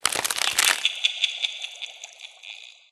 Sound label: spooky treatbag pickup